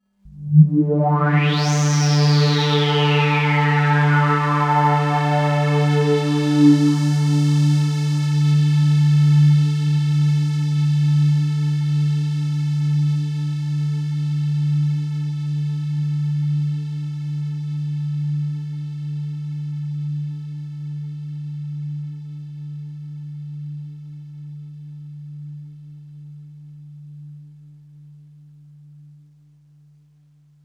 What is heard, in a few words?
Oneshot Synth